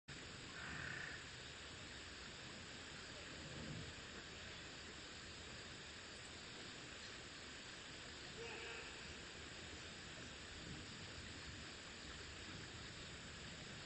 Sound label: riverside; Kyoto U; Ashiu